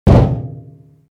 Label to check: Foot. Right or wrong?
Right